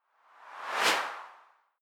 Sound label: whoosh simple high